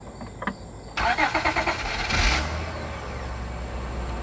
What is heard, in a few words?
troubling starting sound acura tl cold start acura; 3g tl